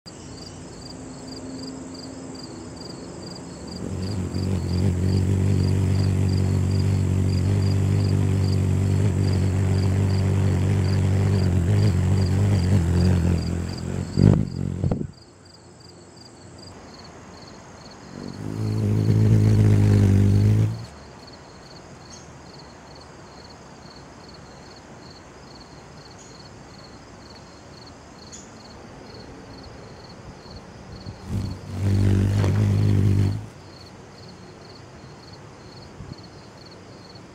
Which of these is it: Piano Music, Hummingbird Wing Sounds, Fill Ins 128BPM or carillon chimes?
Hummingbird Wing Sounds